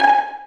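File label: Vio pizzicato G#4; Digital Sound Factory Virtuoso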